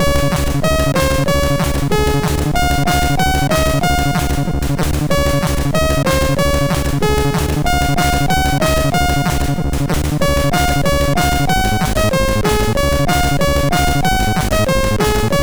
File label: Techno Koleda, MOS6581R2